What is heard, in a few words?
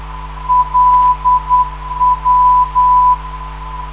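404kHz, non-directional beacon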